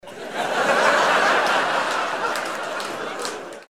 laugh applause

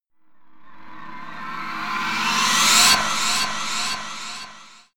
reverse hit scifi

